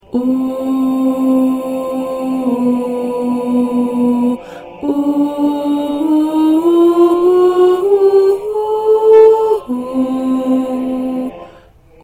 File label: Mezzo